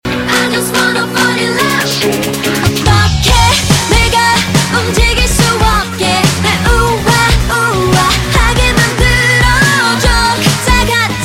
Nhac Chuong

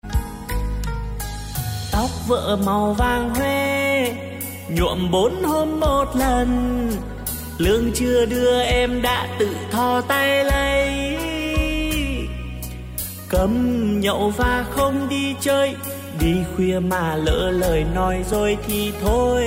nhạc chế

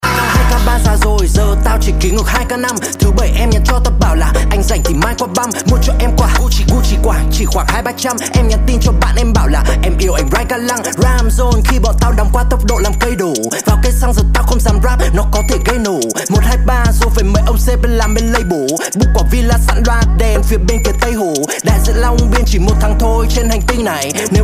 nhac trap

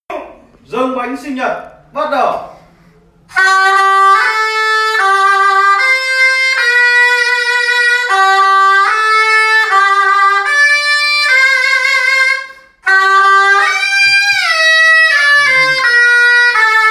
Nhac Chuc Mung Sinh Nhat Bang Ken Dam Ma

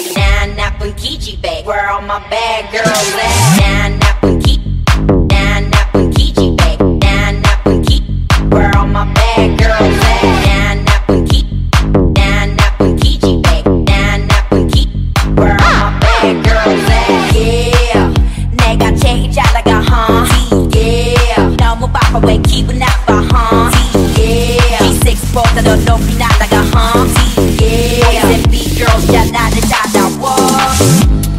nhac chuong remix

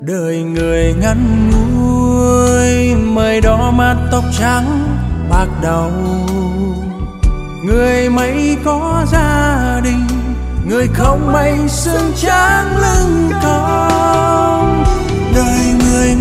Nhac Chuong

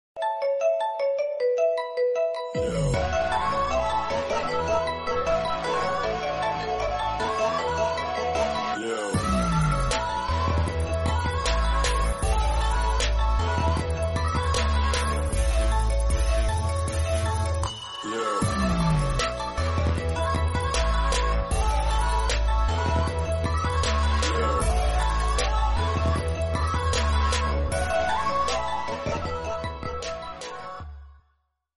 khong loi xiaomi redmi note2

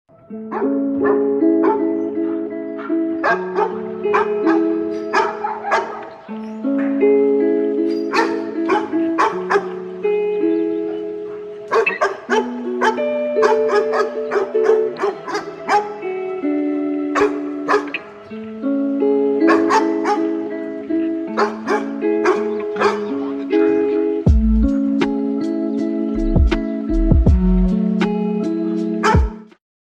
Nhac Chuong Cho Sua Lofi Cuc Chill Cuc Am De Ngu

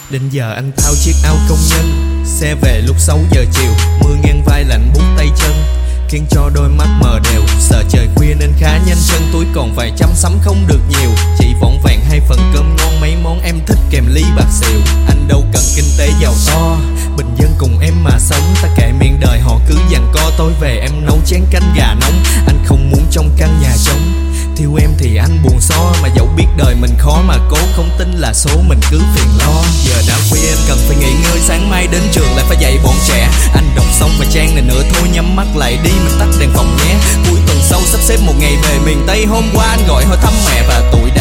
Nhac Chuong RAP

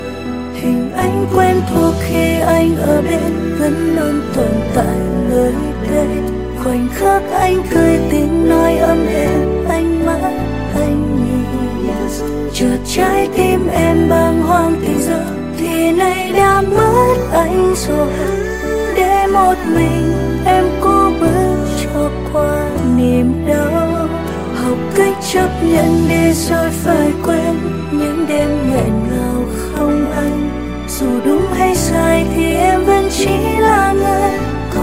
Nhac Chuong

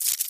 am thanh ban mang nhen

